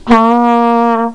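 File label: flugelhorn, notes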